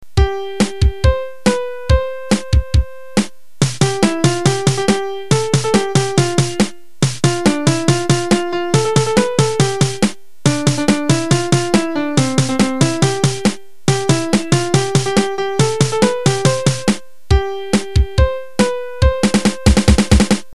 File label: Milk Song rhythm